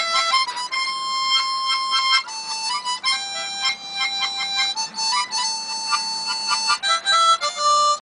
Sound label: Harmonica